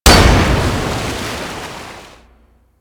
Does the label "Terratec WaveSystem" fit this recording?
No